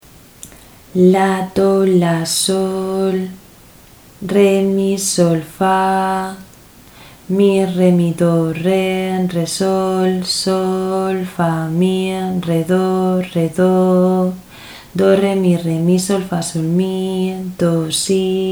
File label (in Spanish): ritmo 6-4; Mixto